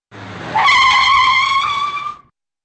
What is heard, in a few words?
short auto pull up and high skid